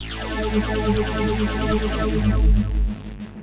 TEKNO 140; Techno

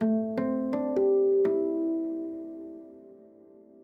ethno, 125, A

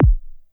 HOUSE BD

